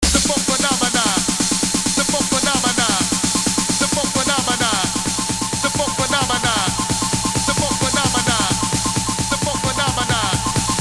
片头音乐

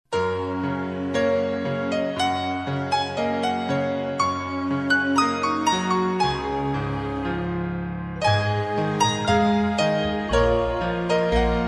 彩铃背景音乐

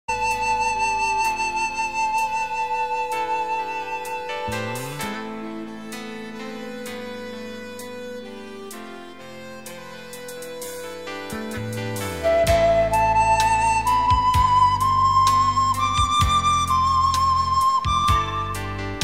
广告片音乐

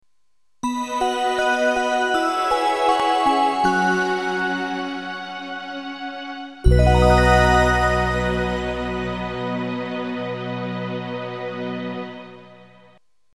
片头音乐

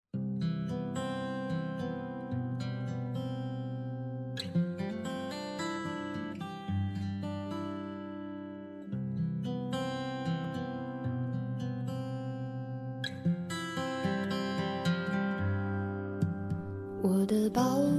轻柔的背景音乐

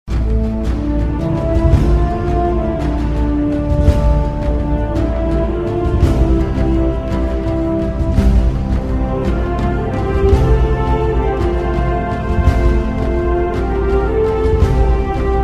激情的背景音乐

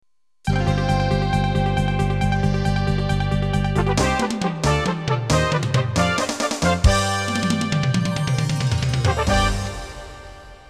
电视包装音乐

